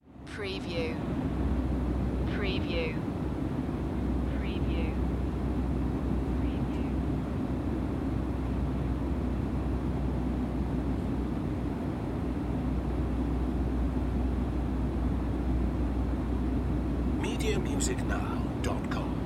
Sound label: Jet Interior; Sound Effects Bible